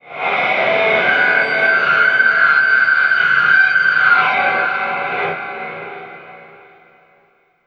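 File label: feedback squall, Alien Guitars; Big Fish Audio; PLASMATIC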